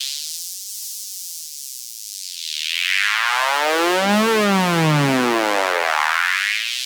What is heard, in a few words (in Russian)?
Mod Schnarrz, звуковой эффект техно транс